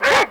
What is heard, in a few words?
DOUBLE DOG; Greytsounds VOL-1